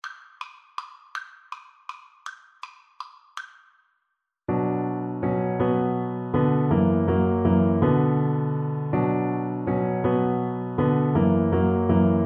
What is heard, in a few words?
DB, Double Bass